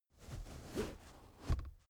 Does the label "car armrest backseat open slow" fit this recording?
Yes